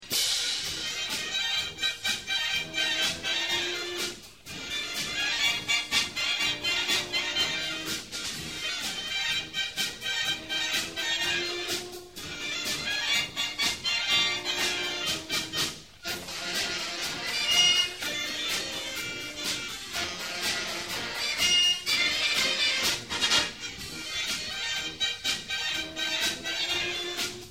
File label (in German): Klarinettenmuckel